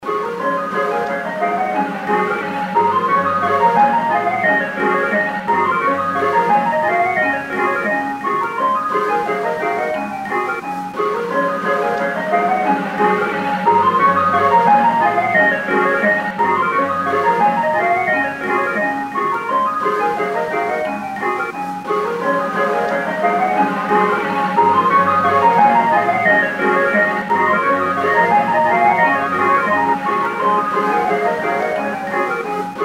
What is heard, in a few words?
Ice Cream 44 BPM